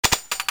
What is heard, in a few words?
draw; csco; csgo; weapons